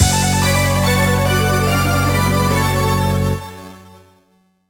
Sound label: Wireless Battle End Failure